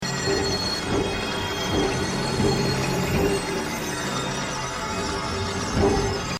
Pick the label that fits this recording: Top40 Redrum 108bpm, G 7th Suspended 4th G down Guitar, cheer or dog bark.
dog bark